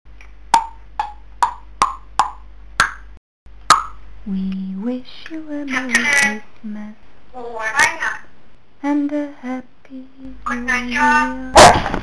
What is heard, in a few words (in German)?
weihnachts klingelton